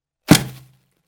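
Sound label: clap sound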